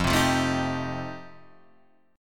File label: F 9th F, chord